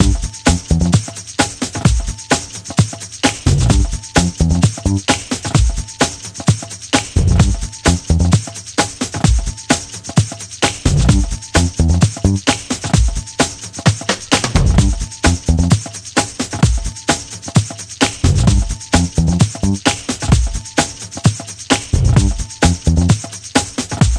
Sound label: Bass & Perc